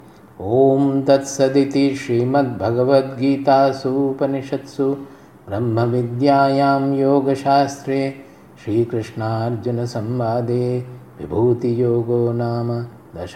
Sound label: Ending Chanting; chapter 10 vibhuti yoga; bhagavad gita; conclusion